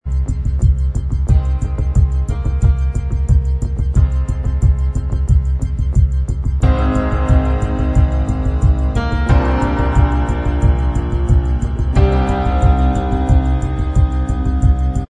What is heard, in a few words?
Inst